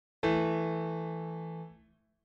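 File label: perfect fourth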